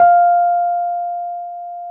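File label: Wurlitzer electric piano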